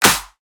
Slap Move Clap

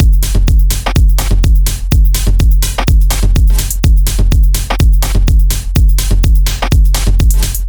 drum loop 125

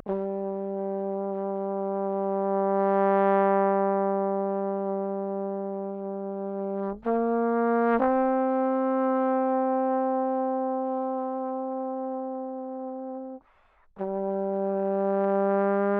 Trombone pan left 120bpm G dark horror

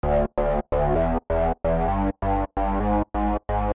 Reese

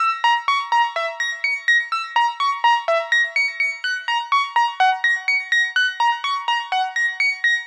TOP 125 Gb

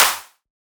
Clap

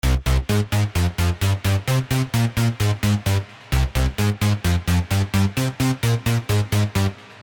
Stab Drive With Me

